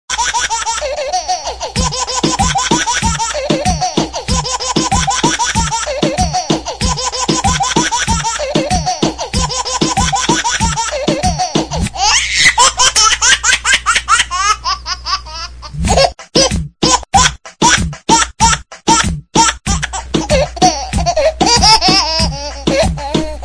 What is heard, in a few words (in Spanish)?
risas de bebe remix